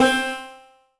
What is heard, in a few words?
Banjos; puchicon; dsi ds